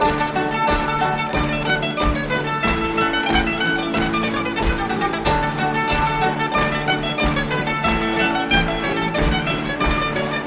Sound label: hornpipe